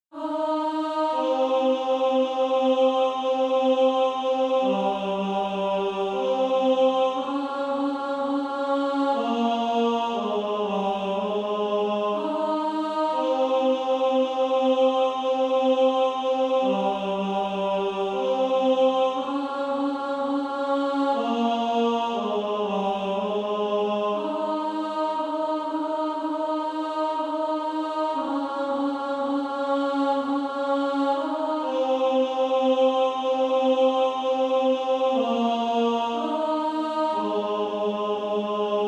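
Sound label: Female Choir, alto 2; On Christ the Solid Rock I Stand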